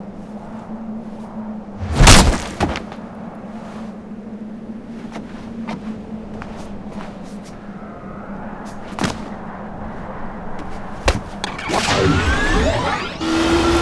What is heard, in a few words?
Jetski; giants